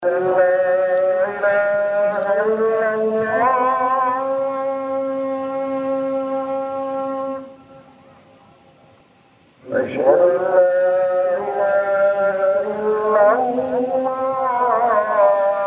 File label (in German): brunei moschee muezzin